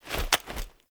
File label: Quad Sniper Reload Catch; Warframe; Weapons; Tenno